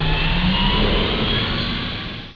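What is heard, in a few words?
jump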